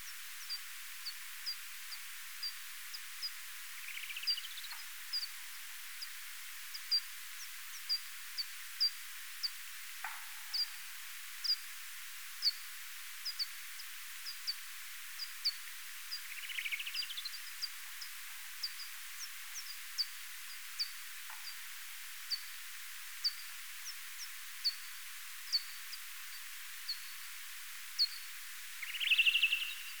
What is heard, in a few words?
Pipistrellus abramus